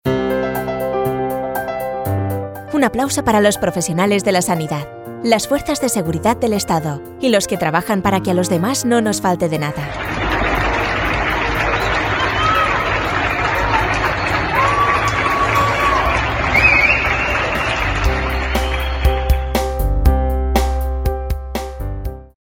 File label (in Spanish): aplausos sanitarios fuerzas seguridad